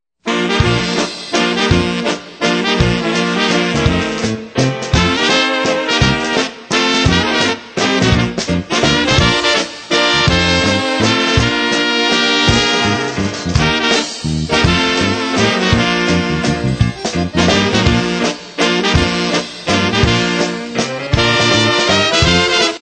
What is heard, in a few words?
waltz